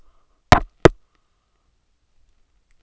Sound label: click